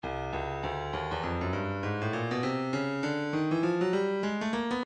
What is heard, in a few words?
breakbeat midi 100 bpm; Stylus